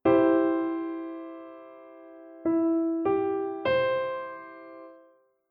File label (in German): Dreiklang Dur Umkehrung